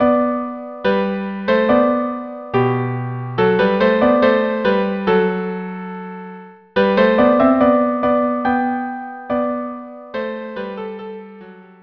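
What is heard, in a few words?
viola cello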